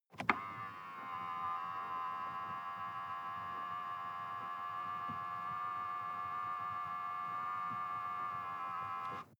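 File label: sports car interior side mirror adjust long; verkehr transport; autos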